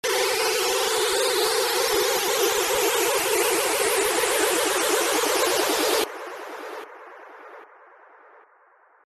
wiper; wiper effect

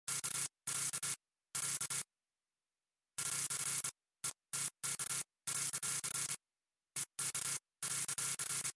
short circuit

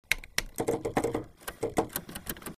fiddle switches

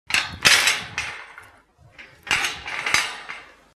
metallic clanks

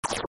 dalek gun

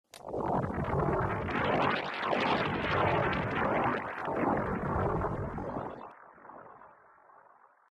wibble

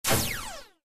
cybergun

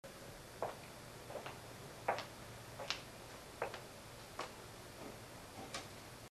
hollow footsteps

